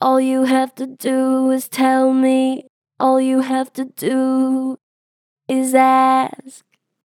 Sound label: Str Flat All you have to do, Vocals, Pitch Flat Strong